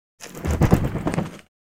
Bat, Fruit Wing Flaps. Low Pitched Flaps Of Bat Wings. Medium Perspective.